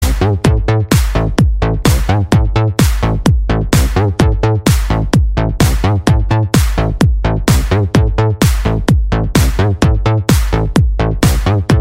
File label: electronic zong